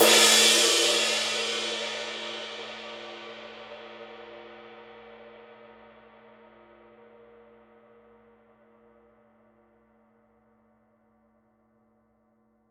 PAISTE TWENTY MASTER DARK CRISP RIDE

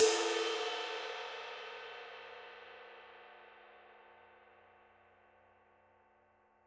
PAISTE TWENTY MASTER DARK CRASH RIDE

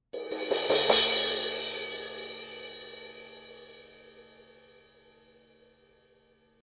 ZILDJIAN 19 PROJECT 391 CRASH

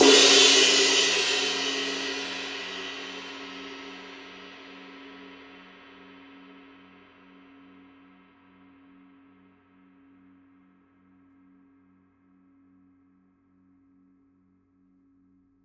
PAISTE TWENTY MASTER DEEP SIGNATURE GROOVE RIDE

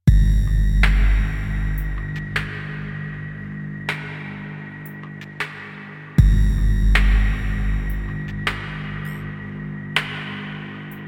NORD DRUM 3P

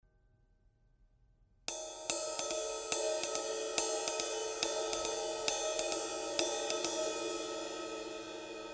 ZILDJIAN 21 PROJECT 391 RIDE

